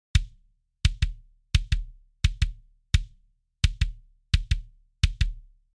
kick on

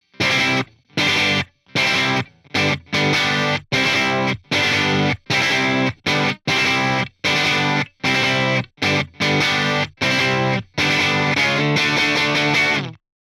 guitar on

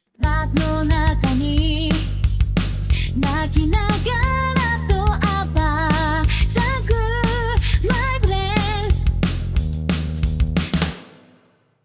bass

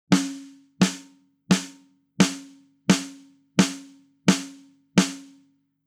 smack Attack Off

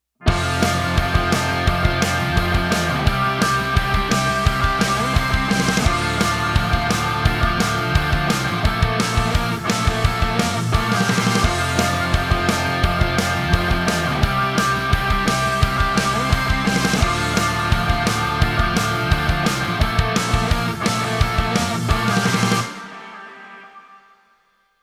imager Off

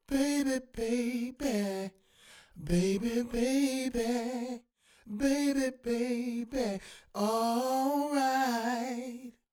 Vocal on

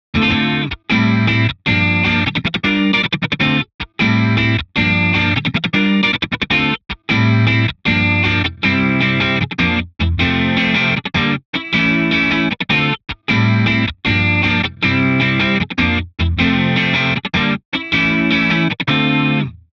chorus

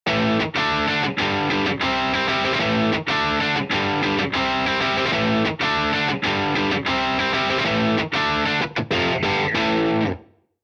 Matchless Cab

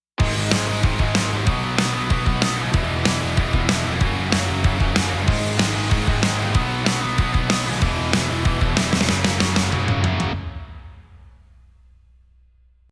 guitar Eq On

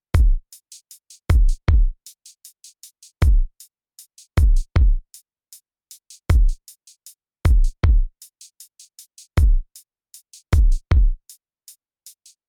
kick

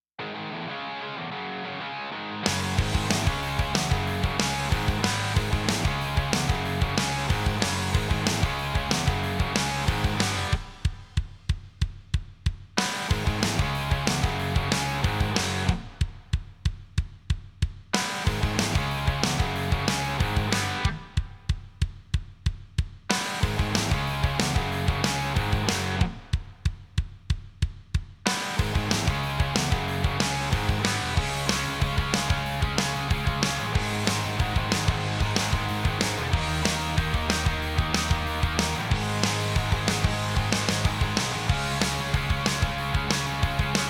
master Off

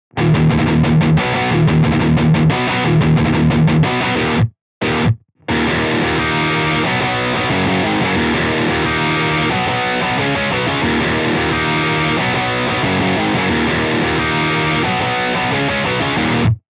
pedal off

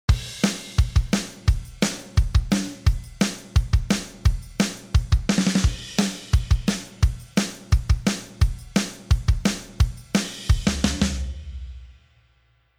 Dyna Mu Drum On; t-Racks

